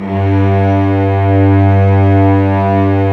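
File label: cellos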